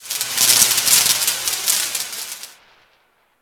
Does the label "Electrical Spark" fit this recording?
Yes